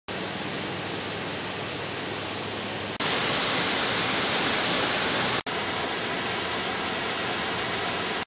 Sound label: Big Manitou Falls at Pattison State Park